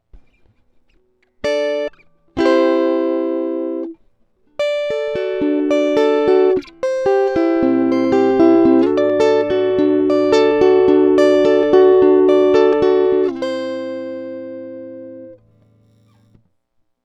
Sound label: e. gtr